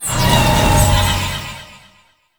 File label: Mirror Portal Confetti Burst; Warframe; Duviri Murmur